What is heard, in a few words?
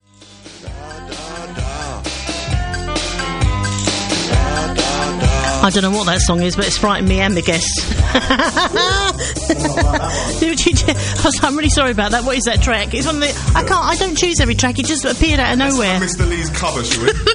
Singer laugh